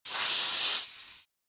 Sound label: Futuristic door air pressure build and release